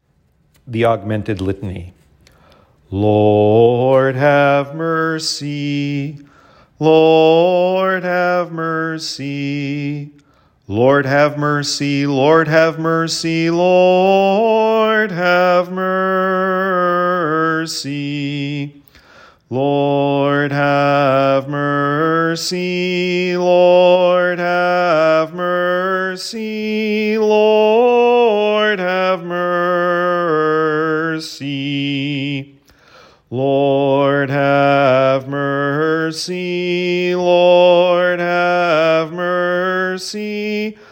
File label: augmented litany